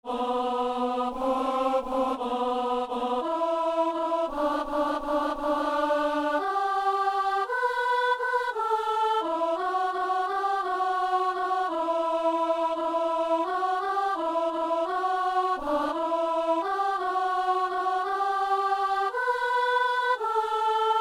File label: alto; Messe du 17 janvier à Saint-Denis